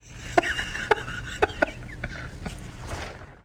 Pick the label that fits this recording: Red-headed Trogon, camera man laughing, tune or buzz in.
camera man laughing